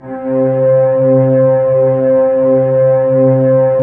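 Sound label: synth Morpheus; Sound & Vision - Gigapack II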